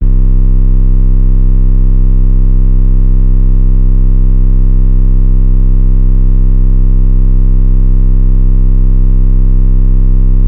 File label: Sub Bass, 808s